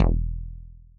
funky disco slap bass hit e

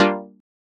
classic house chord pluck gm

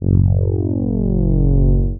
warm reese bass dark and moody Fm

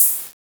classic 909 open hi hat

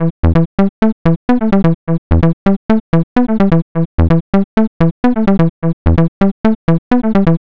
aggressive electro house lead 128 bpm

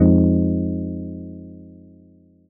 mellow deep house rhodes chord stab Fm

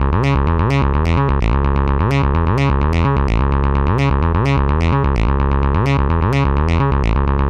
acid bassline 128 bpm c

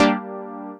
sharp electro lead synth stab in Gm

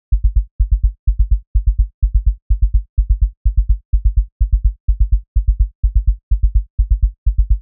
rolling tech house sub

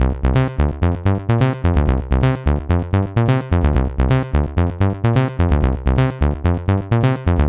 acid house squelch 128bpm

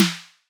classic 909 house snare

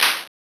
crunchy bitcrushed clap for glitch hop

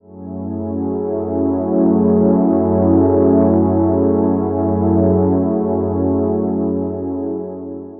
ethereal ambient pads fm

